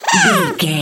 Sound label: goblin fairy attack